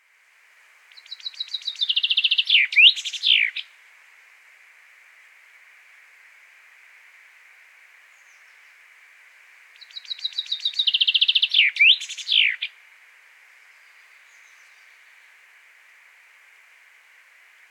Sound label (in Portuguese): canto do tentilhão comum